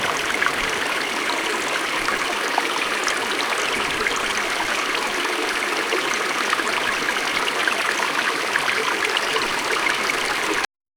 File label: babbling brook flowing through a peaceful meadow